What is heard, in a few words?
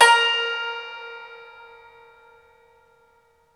SU GZHENG, Earth Tones